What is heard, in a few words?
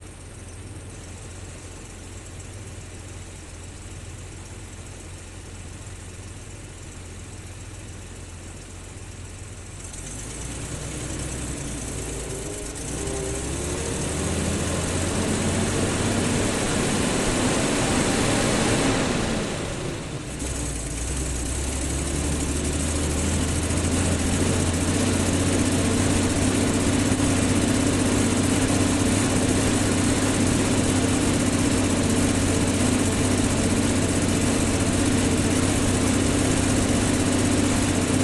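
M8 onboard driving engine; dpa4061; Sonniss GDC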